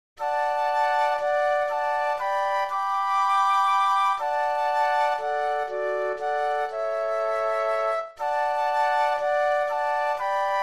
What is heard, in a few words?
Flute Trio